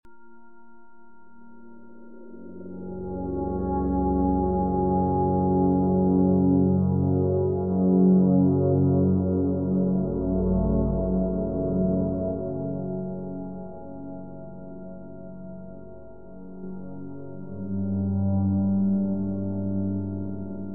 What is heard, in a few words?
Celtic Wind 432Hz Meditation